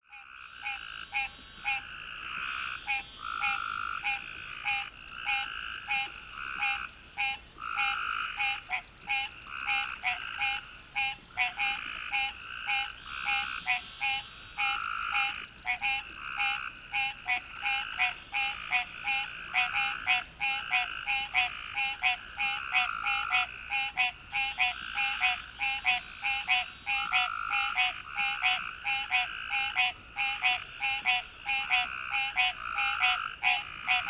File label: frogs by roadside